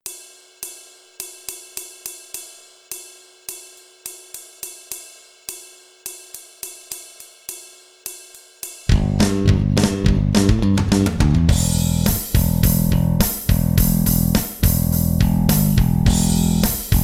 bass guitar; onelivingchurch

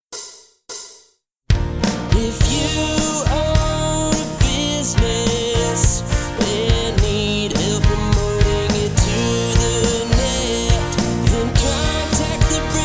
harmony karaoke

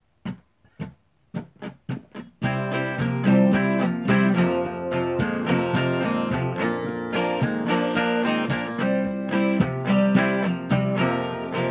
acoustic; brick and mortar; discount car stereo

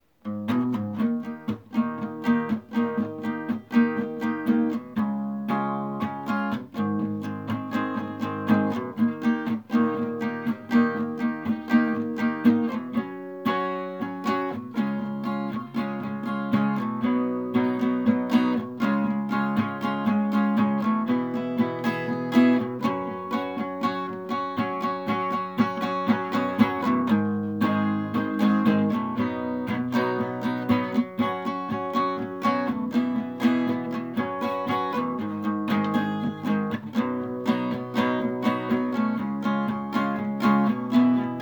acoustic; brick and mortar; gulfway motel

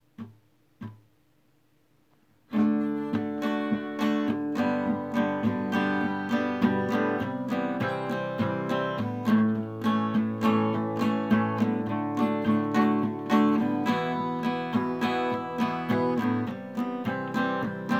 acoustic; brick and mortar; sawa restaurant and grill

